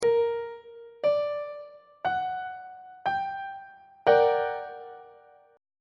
g minor major 7th 1st inversion on treble clef

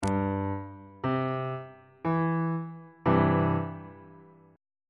d double flat major 2nd inversion on bass clef

